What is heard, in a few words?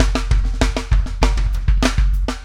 SHRED, Backbeat